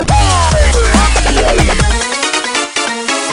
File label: dubstep ringtone